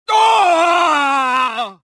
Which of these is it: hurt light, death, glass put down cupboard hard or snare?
death